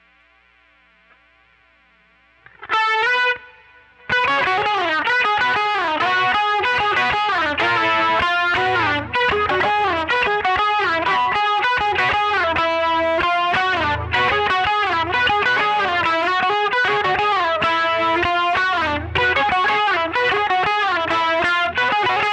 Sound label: quilter solo